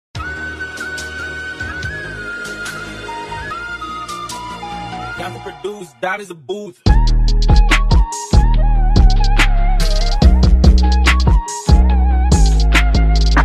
remix ringtone bgm

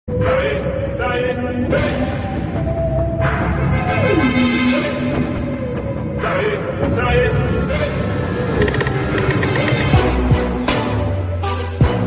villion bgm ringtone, mass bgm ringtone; dhruva movie; ramcharan

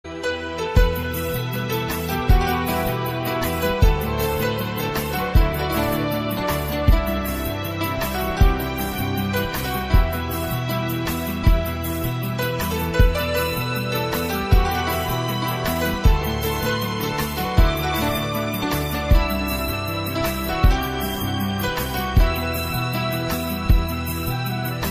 soft instrumental ringtone; aamir khan manisha koirala

